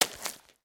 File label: Foot Mud Walk Light; footsteps